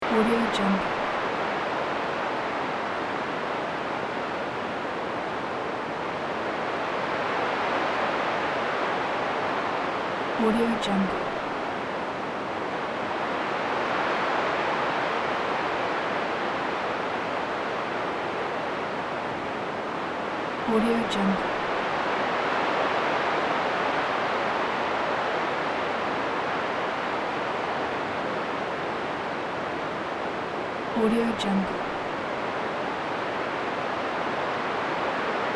meadow wind low loop

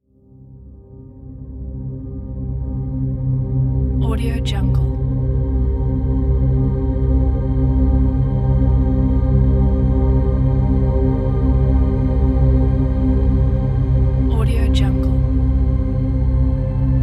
space exploration ambient

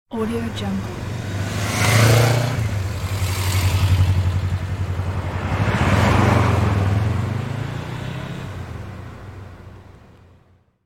forwarded by motorbike not fast; fxprosound

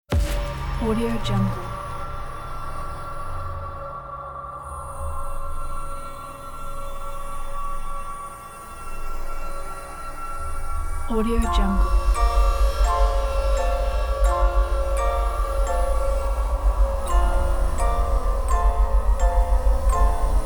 horror house

